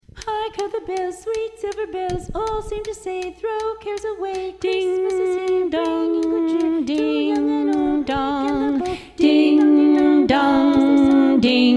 carol bells bari

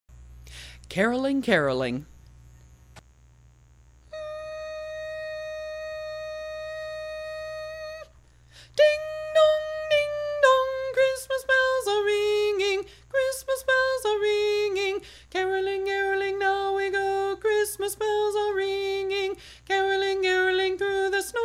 tenor only; caroling

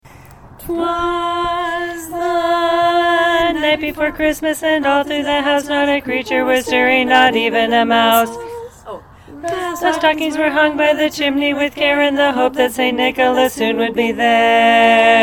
christmas chopsticks bass

